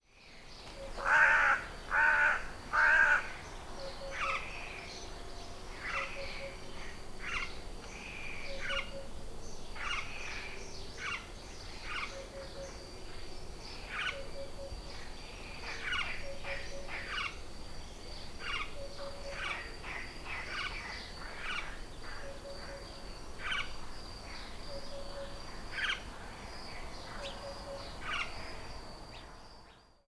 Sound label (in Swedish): svartkraka Corvus corone